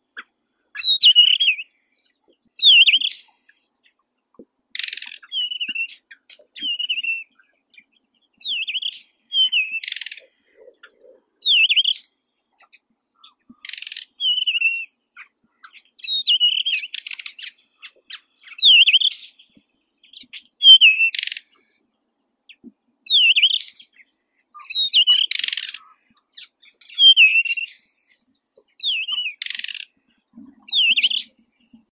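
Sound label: desert wheatear, Oenanthe deserti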